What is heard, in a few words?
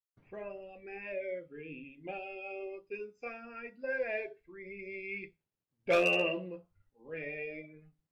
Ring